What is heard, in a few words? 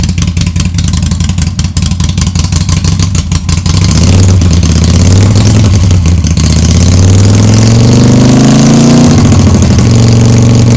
new Rinehart pipes